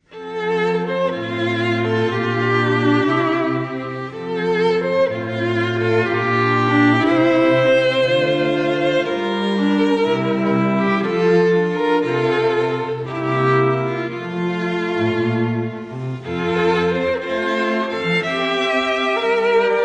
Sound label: festiva strings